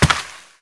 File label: step, rock climb